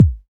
Smooth Bass Drum Sample B Key, Royality free steel kick drum sample tuned to the B note.; kick drum samples